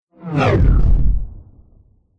sound design whoosh low frequency groan whizz into a thud; zapsplat